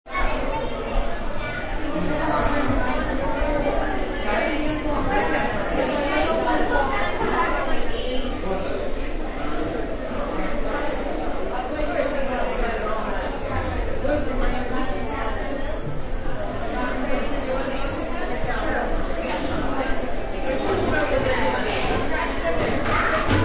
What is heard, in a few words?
Cafeteria, Background sounds inside crowded cafeteria.; Cafeterias